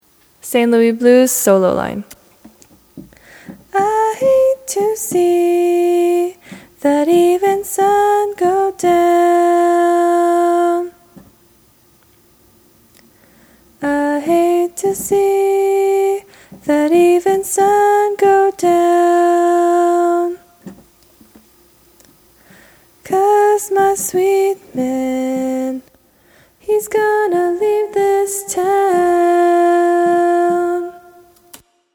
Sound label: solo